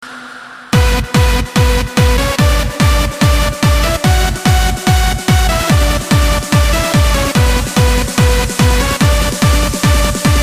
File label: techno